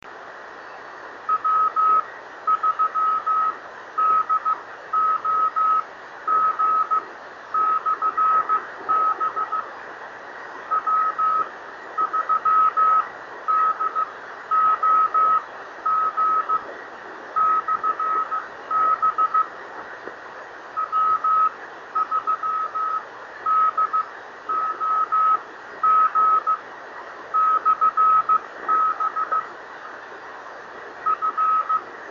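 50.072 MHz, E-skip signal at 5x9, ham radio beacon; W3DOG/B FM28ei 293-mile E-skip signal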